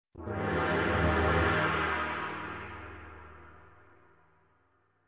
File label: Gong in Large Hall